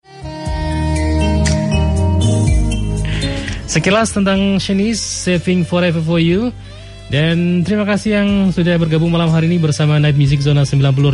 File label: NIGHT MUSIC